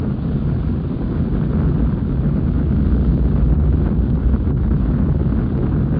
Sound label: Fireball Travel; CastleMiner Z; Xbox 360